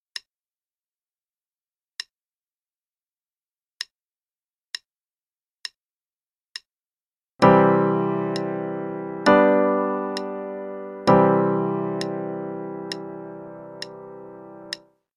C Major I-V-I pattern (qn=66); CM Level Prep; Lesson Books

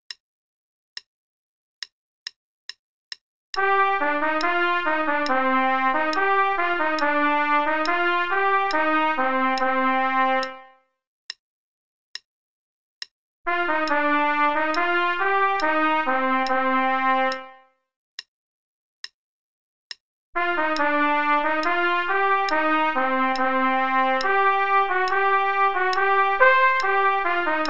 Play-a-long, trpt only, qn=140; Belwin; The Pedlar; trumpet

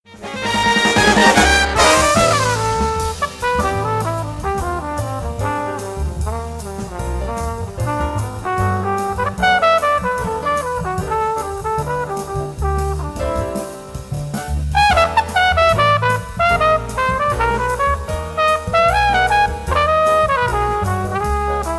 Solo on Big Sky, qn=150

